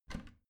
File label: bathroom cabinet warped open heavy; objekte; tueren